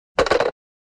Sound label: Phone Receiver Hang up